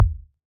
Ball Collision Geo Tackleball Light

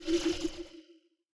Buildup Faster; Heartball

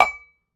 Ball Collision Holiday Mug Med

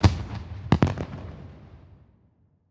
Celebration Fireworks

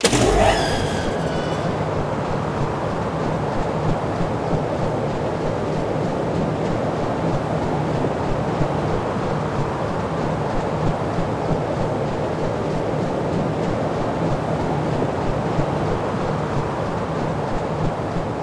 Fan Activate and Loop; Scraprun; Deathrun

